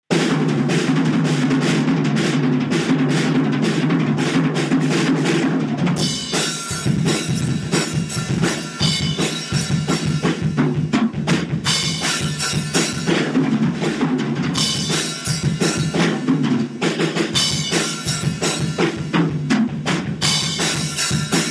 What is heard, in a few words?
drum solo; student drum solo audio